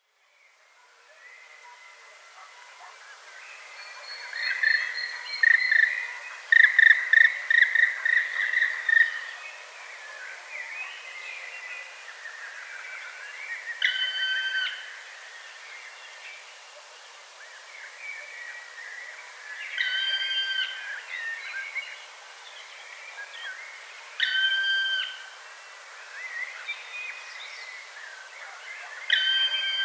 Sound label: Black Woodpecker Dryocopus martius